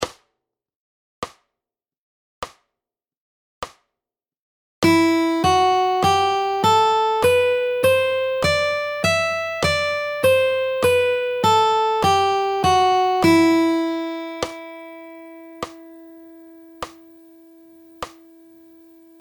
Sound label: Scale Up and Down; Fingerstyle Fun; P1 Getting Started